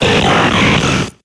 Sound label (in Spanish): Grito de Registeel